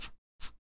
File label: head write